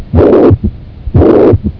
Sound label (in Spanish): regurgitacion mitral